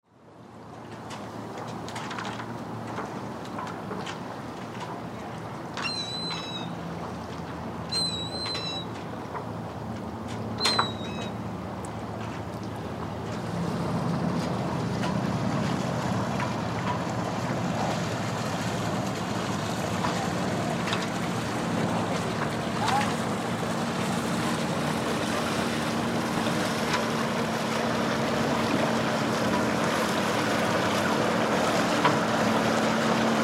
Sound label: Boat Marina Drive By; Boats (Sound Effects:Vehicles)